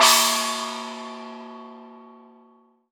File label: Heavy Mental Crash, Drum Hits; Zero-G; Soundclash